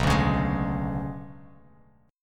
B Minor 6th Add 9th B down Bass Standard, Bm6add9 chord; Bm6add9 strummed; JGuitar